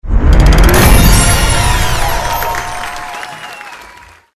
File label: celebrate; emeralds bank